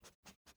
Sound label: cat smell